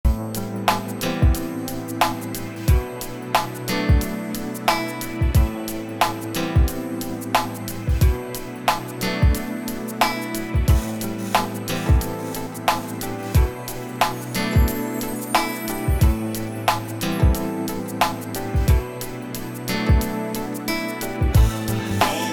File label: beats